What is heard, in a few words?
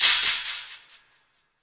echo splash, Electronic Hits